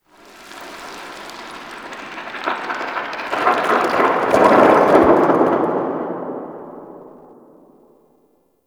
Rock Fall Cliff Distant; Ambience; Warframe

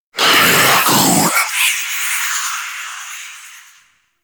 Super Death Screams; Warframe; Dante

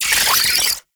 Oraxia Brood Spider Vocal; Warframe

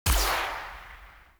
Tranquillizer Rifle Tail; Warframe; Weapons; Tenno

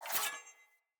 Sword Accent Blade; Weapons; Player; Swords; Garren Sword

